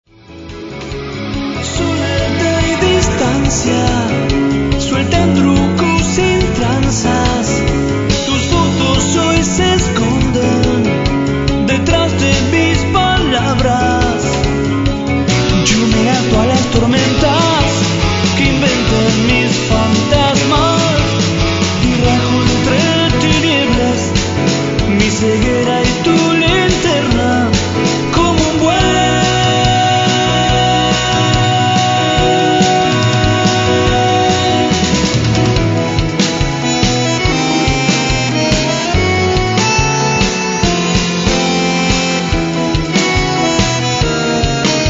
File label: tango